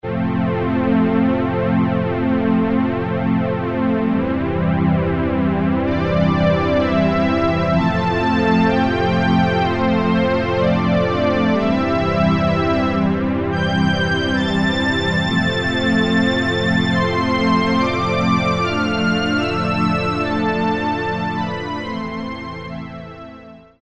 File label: StringOrgn, Roland MKS-50; Factory examples